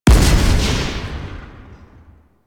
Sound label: Sticky Bomb Proj Explosion; Grineer; Veilbreaker; Vor